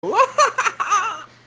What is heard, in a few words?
uahahaha